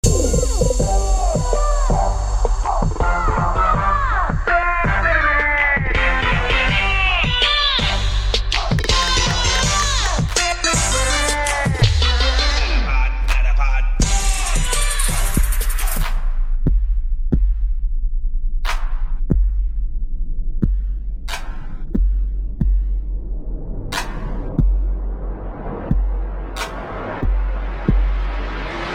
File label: Samsung S10 Ringtone